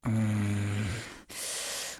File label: groan